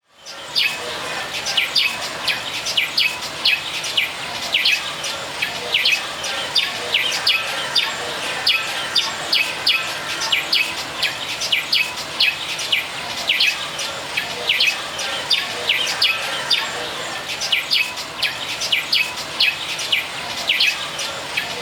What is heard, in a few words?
MORNING BIRDS ALARM RINGTONE